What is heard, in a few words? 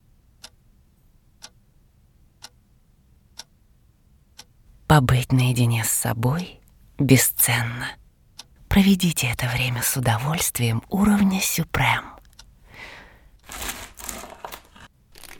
ASMR; Supreme